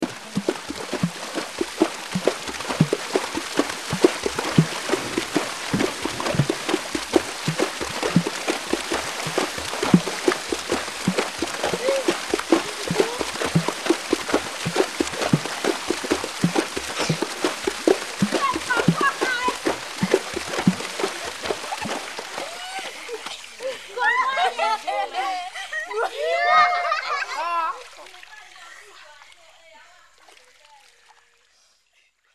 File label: Water Drums